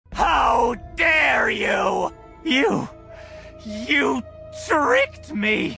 hehehehehahahaha

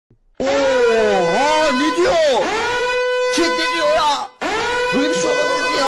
siren; chat ne diyo ya siren sesi ile

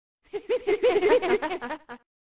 laugh; ben drowned

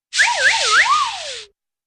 airy twirly

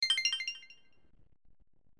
notification; persona 5

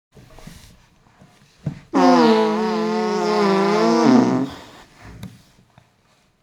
smelly fart

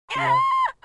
gasp; edds

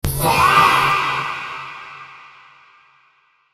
faah slowed

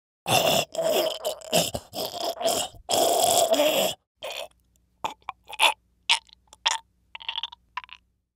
man choking sound

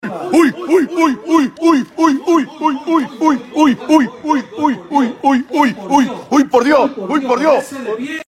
uyuyuy

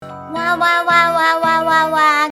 wawawawawa

